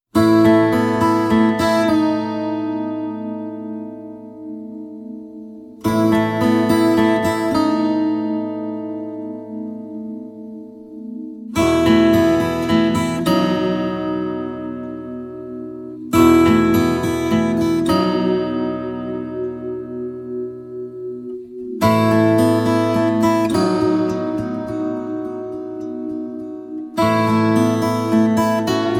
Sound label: no piano